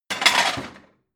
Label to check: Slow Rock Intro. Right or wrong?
Wrong